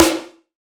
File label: Acoustic Drums - Snare; Heavy Acoustic Drums; Antidote Advent